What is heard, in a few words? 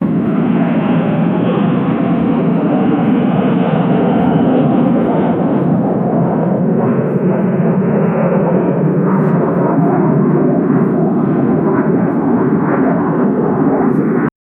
business jet flying